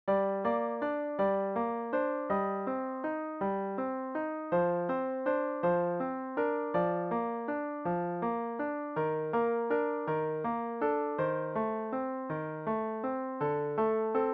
sonatine sol mineur

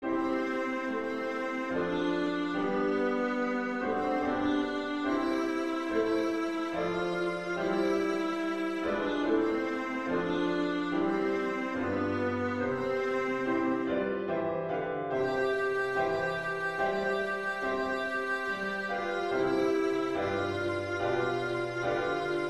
hymne national anglais

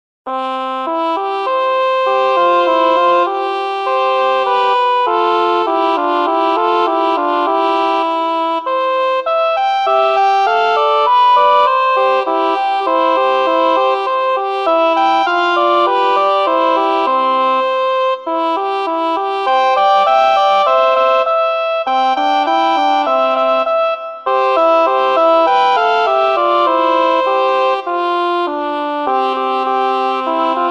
petit duo en ut pour trompettes

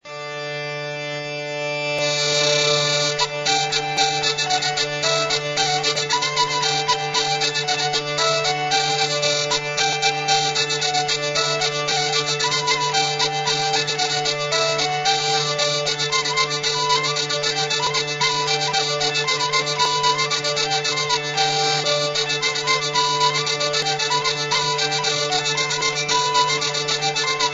bourree

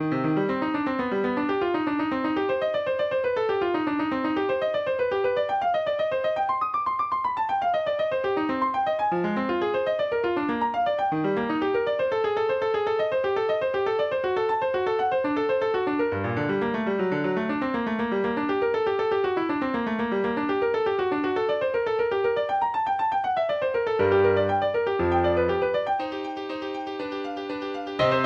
pour piano acoustique; bach carl philipp emanuel; solfeggietto n 2 h 220 w 117

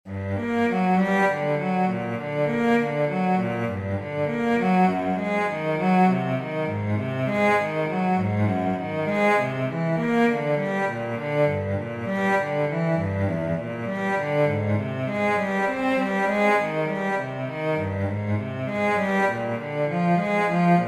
breve pour violoncelle

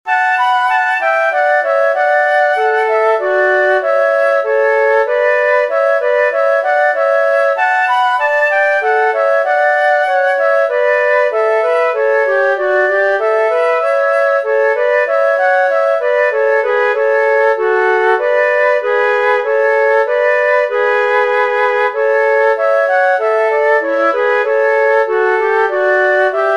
menuet; John Banister

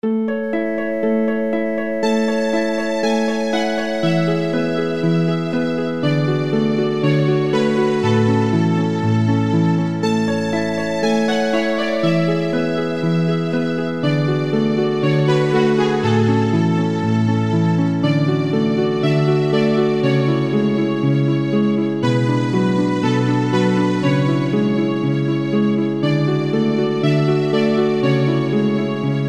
duo violon harpe; chauveau bruno promenade musicale

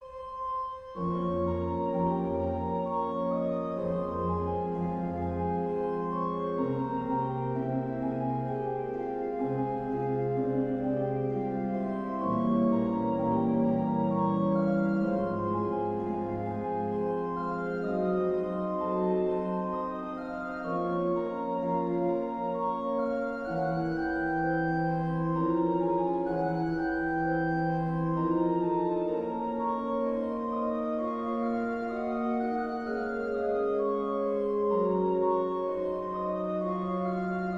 piece pour grand orgue

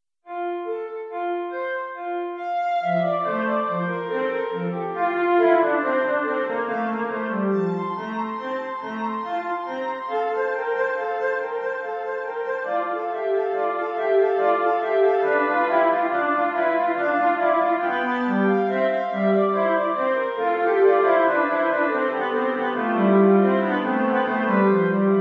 inventions a 2 voix strings; bach johann sebastian

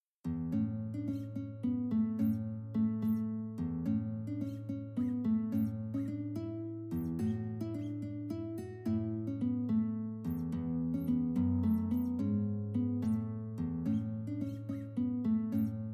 danse villageoise

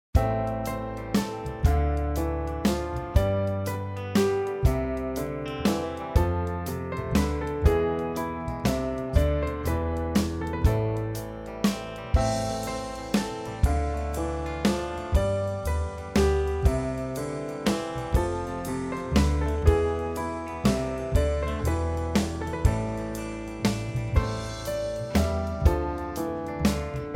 menuet mineur; krieger johann

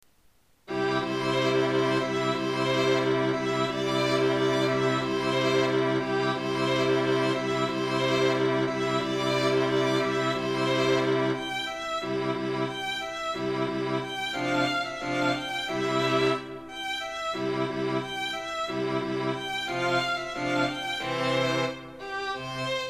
quatuor cordes; dans fora lointaine

